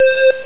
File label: tune; 8-bit Sampled Voice; 8,363 sample rate